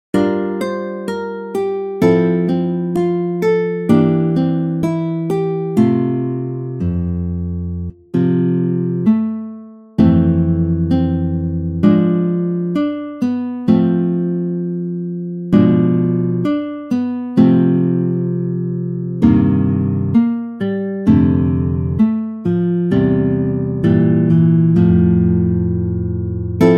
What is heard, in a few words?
rocking carol guitar